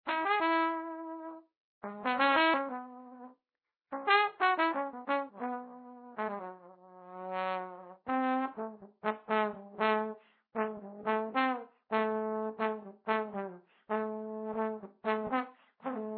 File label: Trumpet; Club Penguin Online Unofficial Soundtrack